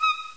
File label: pipe